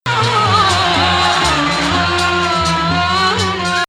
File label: Rast 5th; S-B 5th